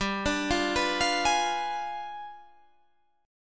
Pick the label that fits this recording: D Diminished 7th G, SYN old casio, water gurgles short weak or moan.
D Diminished 7th G